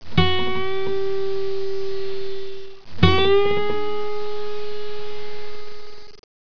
bend, guitar

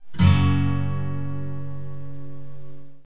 fs chord, guitar

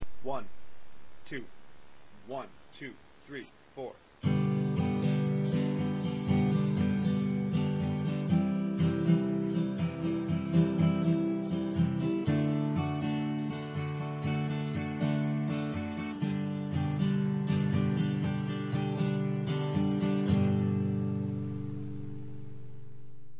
strum, guitar acoustic

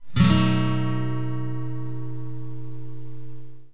b7 chord, guitar

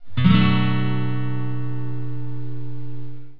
bm7 chord, guitar

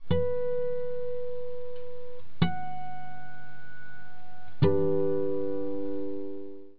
harmonic, guitar

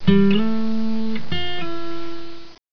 slide, guitar

